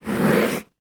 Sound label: Foley Footstep Golf Cleats Scrape On Cement; Foley Footsteps